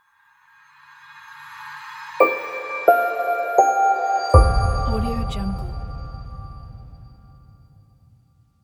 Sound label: smooth logo